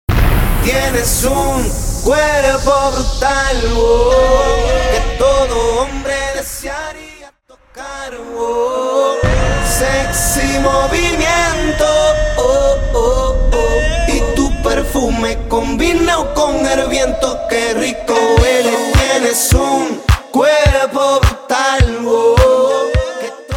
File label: Reggaeton Acapella Starter 105BPM